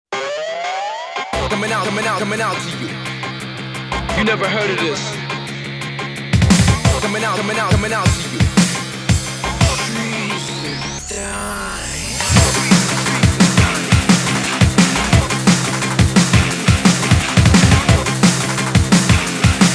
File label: DNB; droctagontrees